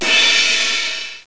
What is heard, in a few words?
cymbal